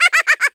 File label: Chip